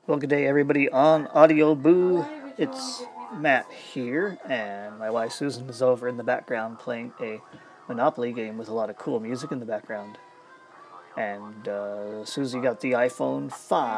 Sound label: radioactive electronic christmass audio